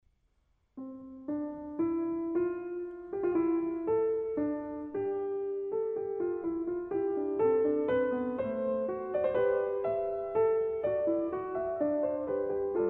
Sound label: piano; BWV 846